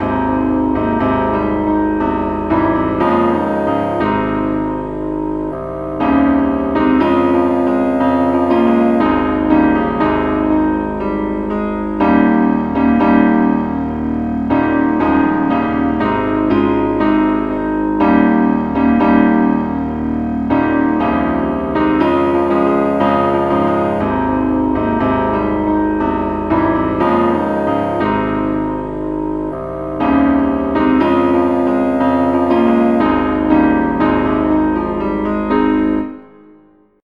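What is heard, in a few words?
keyboard key C sharp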